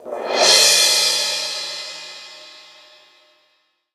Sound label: Crash Rev; LexLuger; pitchglitch